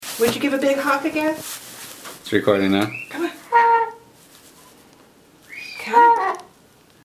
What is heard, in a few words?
rehab swan